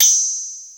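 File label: FM tambourine; Crypton - DrumBase5000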